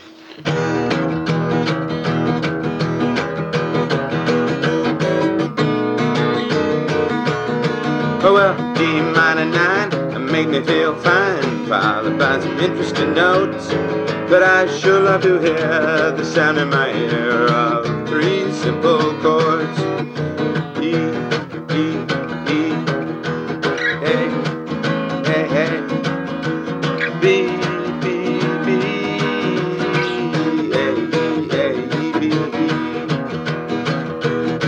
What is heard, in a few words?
three simple chords